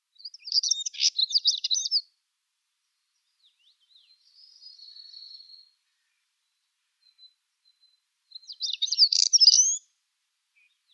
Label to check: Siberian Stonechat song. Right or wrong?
Right